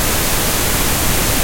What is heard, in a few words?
pink noise; disco danny